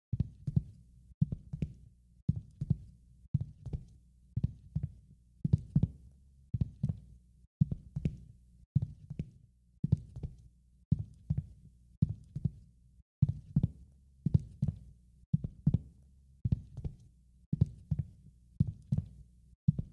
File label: Homemade Heart Beat